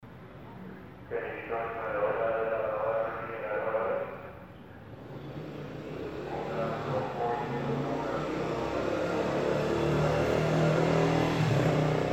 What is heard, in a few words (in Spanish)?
paisaje sonoro